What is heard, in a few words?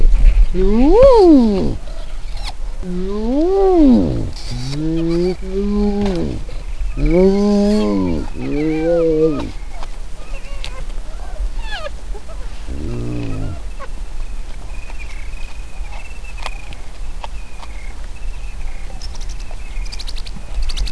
hyena